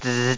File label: buzz